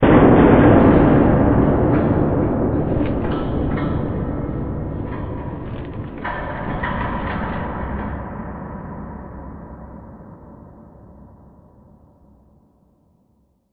big hit far

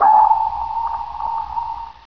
sonar

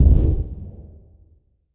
mp5 burst far

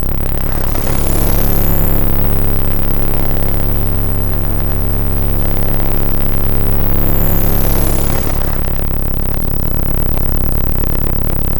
ambience

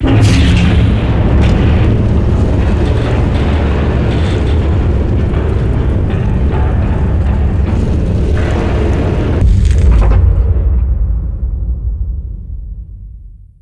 blast door open; dm backrooms complex

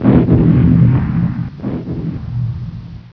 battle

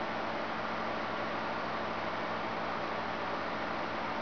fastline station; school

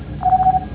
peep peep

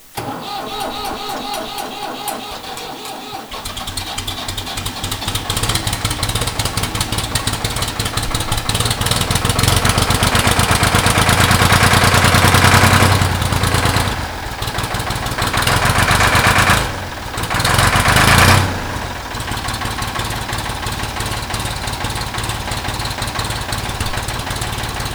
diesel startup; dm research vessel

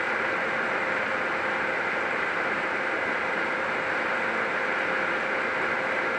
ambience

